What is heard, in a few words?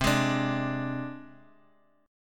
C Suspended 2nd Sharp 5th C Guitar Open D; down; chord shape